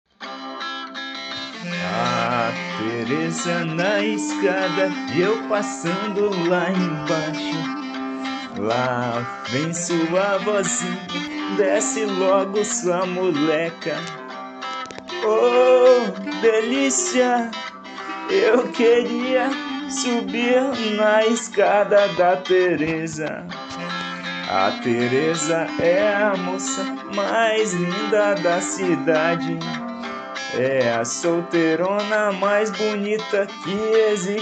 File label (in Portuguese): saxofonista